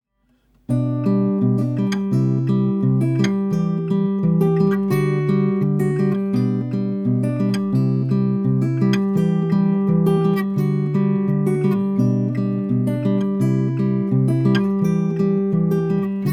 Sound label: Acoustic Guitar; DelaySon